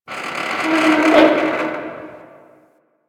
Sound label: Metal Creak, ambience; One Offs; Warframe; Quests; The New War; Drifter Camp